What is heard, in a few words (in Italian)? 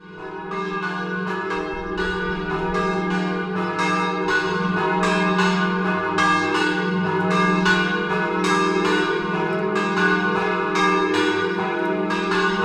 San Martino di Campagna, campane